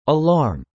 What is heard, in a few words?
alarm